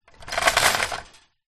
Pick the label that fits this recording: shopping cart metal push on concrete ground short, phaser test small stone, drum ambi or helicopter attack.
shopping cart metal push on concrete ground short